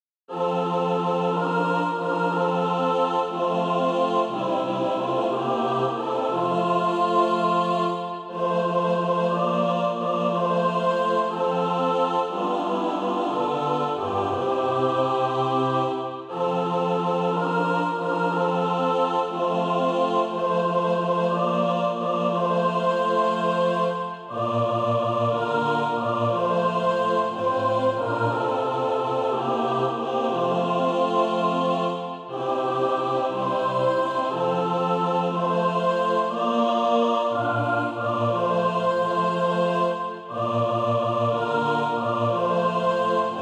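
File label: mixed, Practise Music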